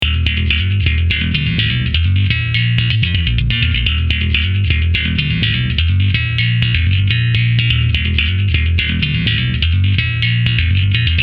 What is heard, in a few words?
bass nutt